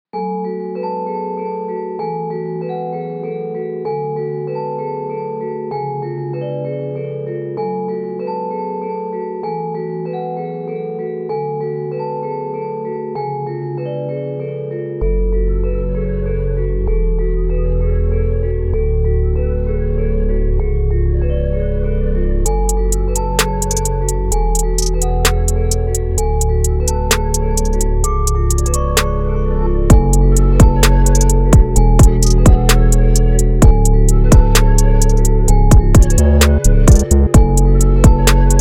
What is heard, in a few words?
beat